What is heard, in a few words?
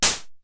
DF-500 HiHat, Drumfire